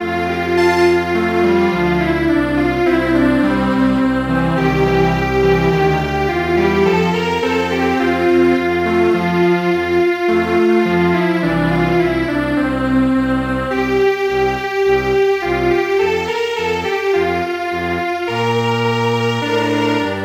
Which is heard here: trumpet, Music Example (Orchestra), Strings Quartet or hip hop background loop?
Strings Quartet